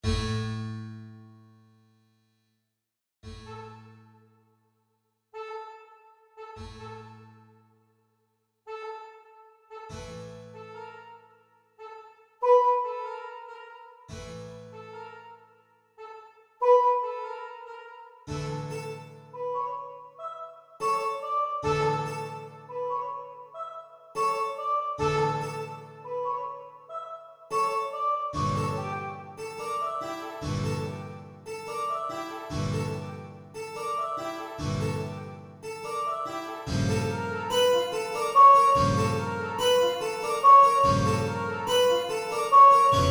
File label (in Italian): Piccolo Mondo Arabo, MIDI